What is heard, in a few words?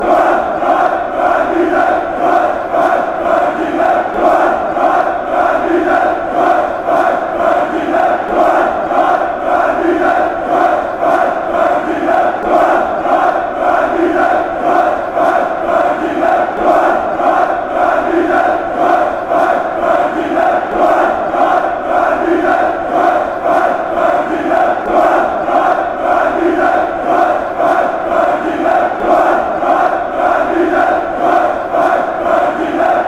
copenhagen chant